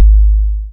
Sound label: 808 kick